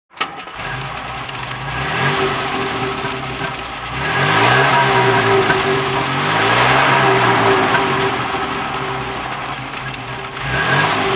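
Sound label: jaguar mk4 dhc throttle, Engine sound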